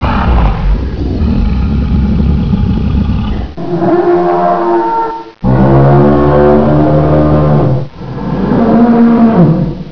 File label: garg combat